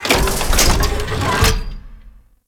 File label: Vendor Cart Open; Solaris United; Warframe; Venus Open World